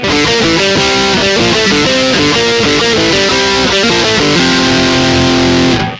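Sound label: Strat Marshall neck, Neck pickup, Sansamp в режиме Marshall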